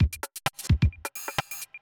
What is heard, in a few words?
electro kit 130